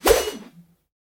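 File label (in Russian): виртуозные взмахи гусарской саблей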